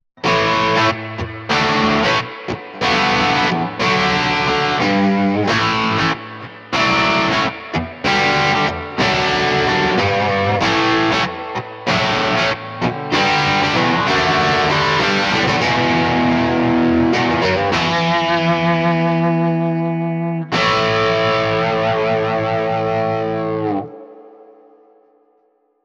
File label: Mesa Boogie Rear Spring; Neural DSP